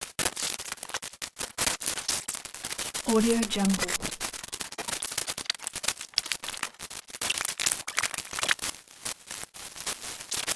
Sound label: glitch interface; soundmorph